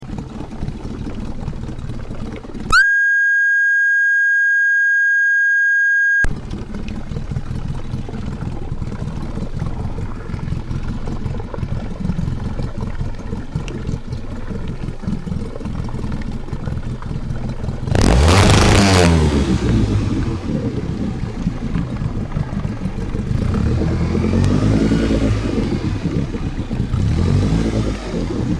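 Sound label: dinghy outboard 1 m stereo; Griffin Bay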